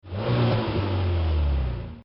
Rev; disney; cars; Bob Cutlass

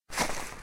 leftnav expand; fairies

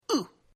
male hit; phineas and ferb; snowbrawl

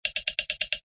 small tick drag

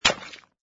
Landing Conveyer; conservation catapult; project green

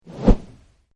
woosh out; Wreck-It Ralph

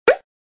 pop; Cars; tractor tip

